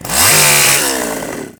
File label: Ghoul Sawman Swipe; Warframe; Grineer Ghouls